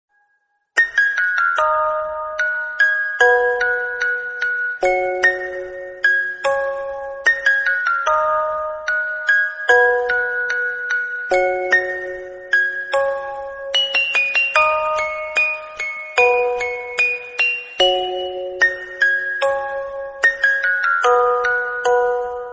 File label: INSTRUMENT ALARM TONE